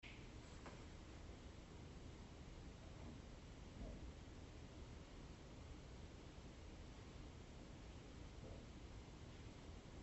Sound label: Ambient Noise